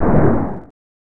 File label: Explosion; The Simpsons Bart's Nightmare; SNES